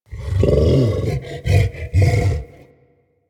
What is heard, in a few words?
Juggernaut Truffle Fidget, Infested; Warframe; Truffle Hunt